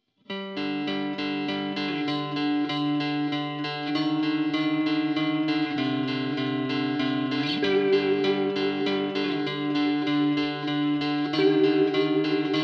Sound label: Crystal Uprising (Instrumental)